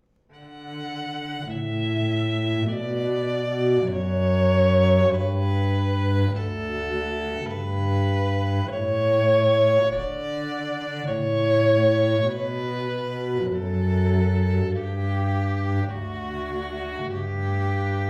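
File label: duo violin cello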